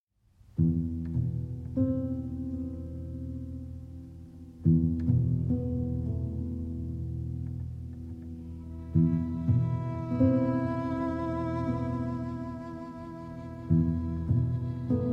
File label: Nocturne in E Minor, for Piano, Violin, Cello; Op. 72, No. 1